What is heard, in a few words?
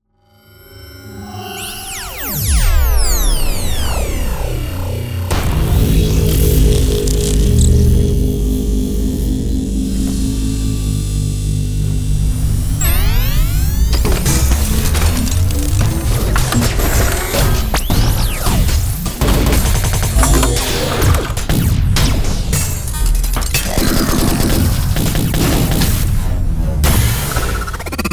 Autobots Transformers Sound Effects; Bluezone